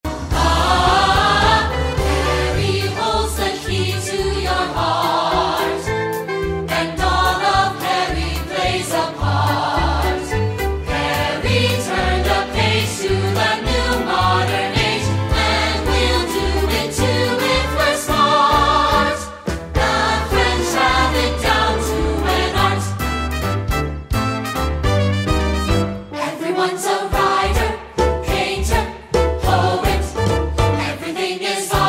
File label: Audio Vocal; audition